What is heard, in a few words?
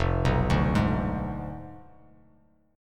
F Diminished 7th F down; Fdim7 arpeggiated; JGuitar